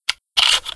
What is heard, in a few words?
colt clip